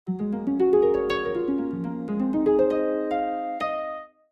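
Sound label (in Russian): музыка арфы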